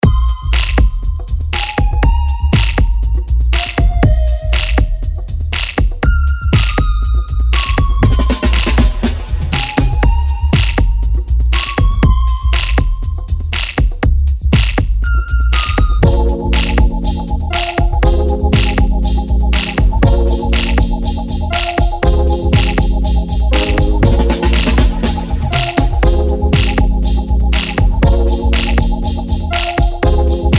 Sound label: dreary beat 120